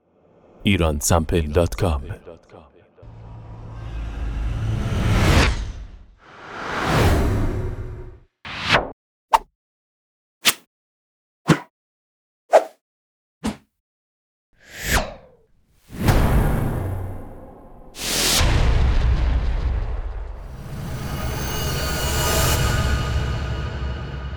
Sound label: whooshes